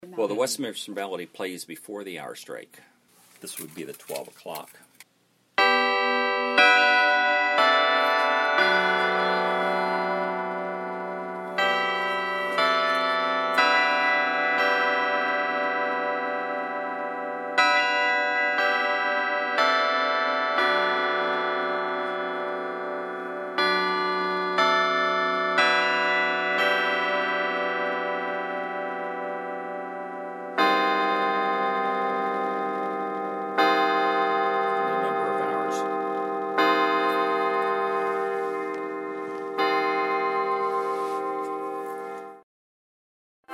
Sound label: Carillon Music